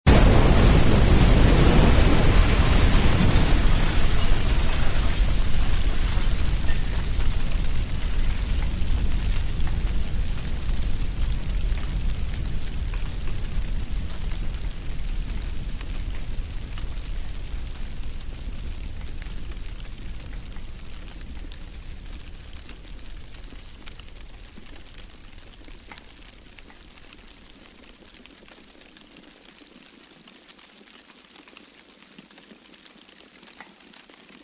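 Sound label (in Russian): взрыв со вспышкой пламени и горением; Звук взрыва от бомбы или снаряда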